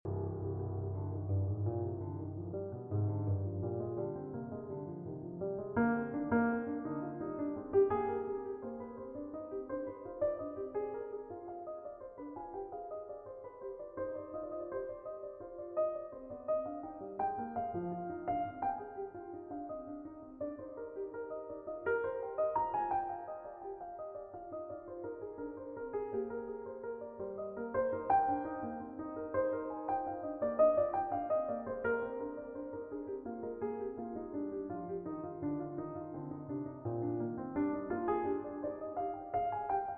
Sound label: Invention in C Minor